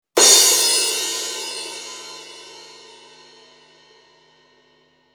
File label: crash 16, cymbal; sabian